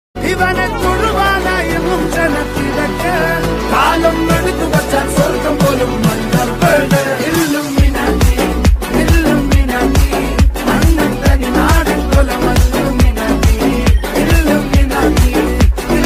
entry bgm ringtone; ranga entry; aavesham

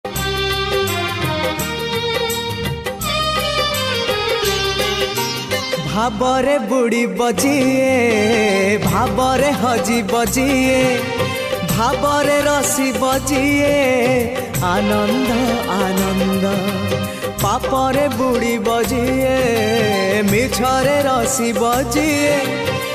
odia bhajan jagannath ringtone bhajan ringtone

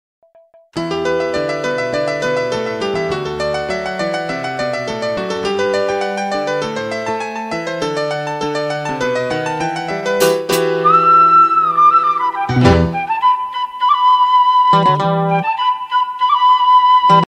starting bgm old romantic bgm; guruvayurappa

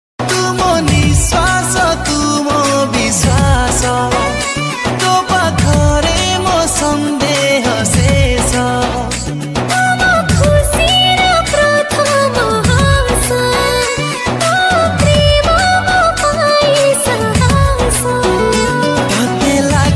odia romantic status odia ringtone

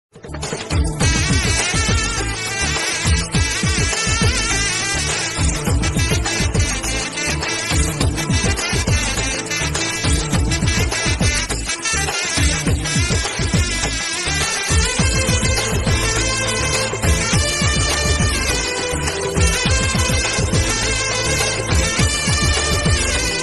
flute bgm ringtone

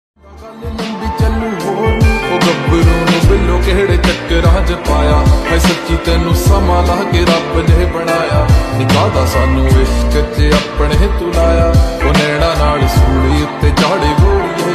punjabi song ringtone; new trending ringtone

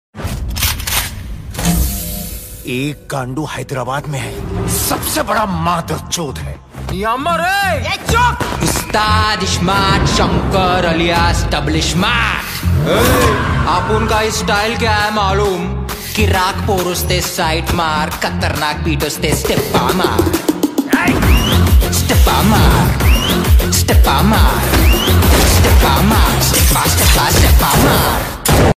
ringtone bgm

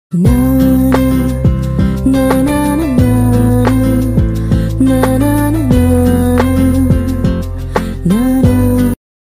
bgm ringtone in telugu; Oh Baby

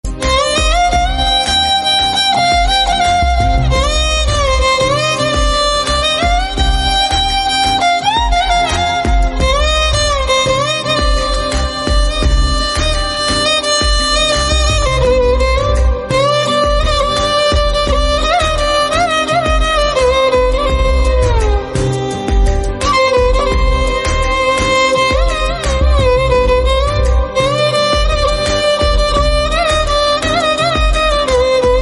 violin ringtone violin song

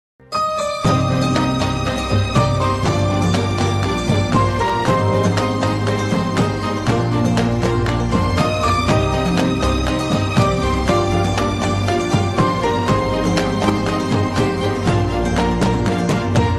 love bgm; siva manasula sakthi

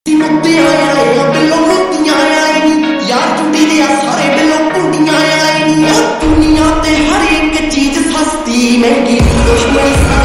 new punjabi song ringtone

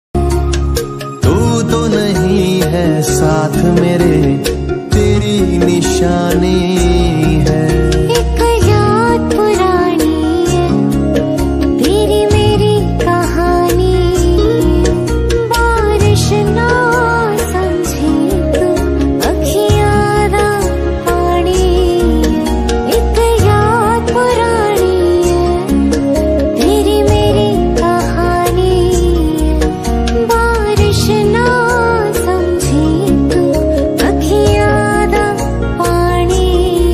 sad ringtone

